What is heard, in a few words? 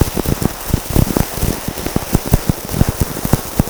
Carpet, noise; Logic Pro X; Alchemy; WaveNoise